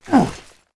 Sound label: monster; ARCANE